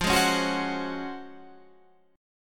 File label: Db Major 11th F